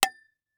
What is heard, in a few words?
Impact Cement Hit By Medium Metal Pipe; Impacts